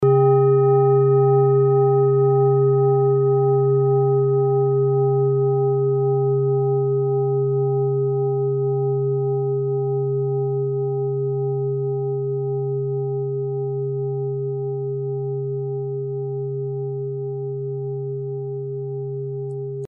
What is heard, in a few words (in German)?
klangschale set